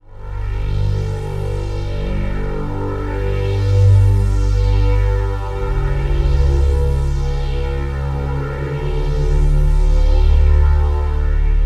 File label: Pad; Routed Sound; Serum 2 Expansion - Star Scavenger Vol.1